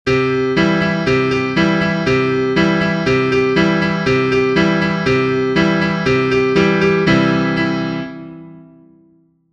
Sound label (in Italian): Piove pioviccica, pianoforte